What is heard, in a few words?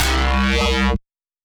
bonk; bass